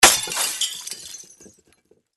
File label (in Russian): zvuki bushegosya stekla sms